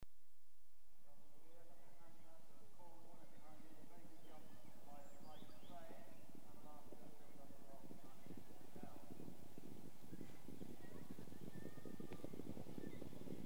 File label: Point to Point Racing - field passes over jump, one straggler, Equestrian Events; BBC Sound Effects Library